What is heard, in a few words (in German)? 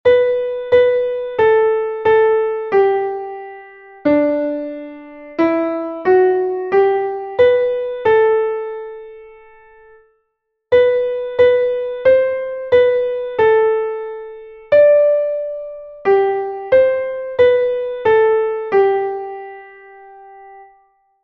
klavier; MelodieMeister